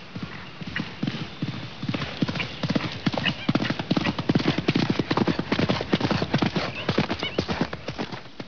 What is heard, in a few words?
gallop